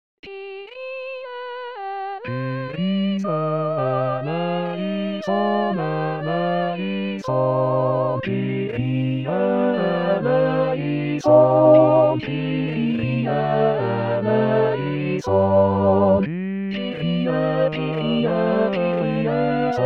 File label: kyrie tenor; 2023 st jean